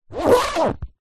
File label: laptop case fabric main zipper; zapsplat